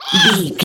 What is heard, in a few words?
goblin fairy attack